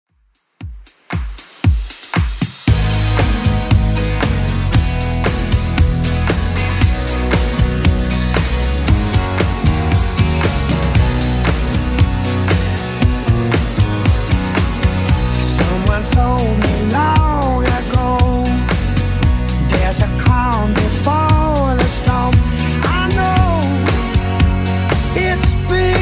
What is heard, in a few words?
80s Club Edit 116bpm